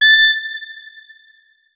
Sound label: notes, saxophone; Hideout Furniture